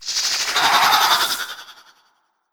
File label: Naga Scream